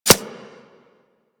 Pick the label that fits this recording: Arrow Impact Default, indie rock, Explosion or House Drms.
Arrow Impact Default